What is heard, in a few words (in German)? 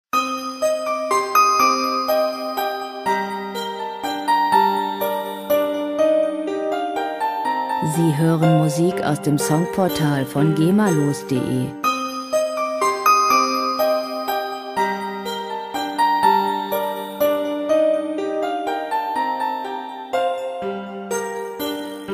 annas spieluhr